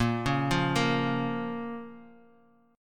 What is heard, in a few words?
Bb Minor, Guitar; arpeggiated; chordshape; 5,4,5,3,x,x; JGuitar